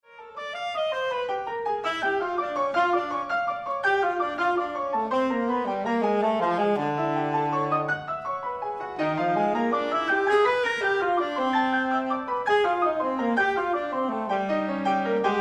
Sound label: Sax Fantasy